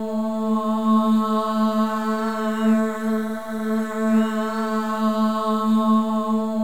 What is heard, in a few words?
MOVING V4, Symphony of Voices; Spectrasonics